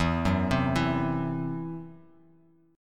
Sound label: E Suspended 2nd Sharp 5th E down Bass Standard; Esus2#5; arpeggiated; JGuitar